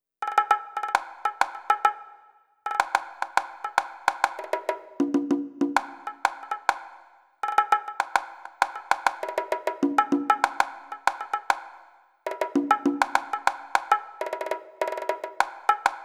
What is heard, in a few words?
perc 100